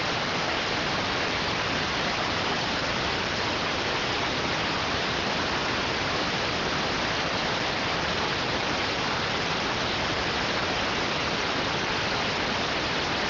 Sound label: rtty rx